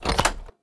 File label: Open Lock